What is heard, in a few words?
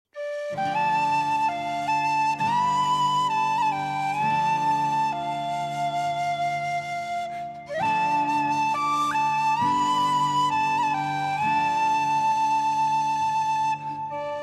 No Vocals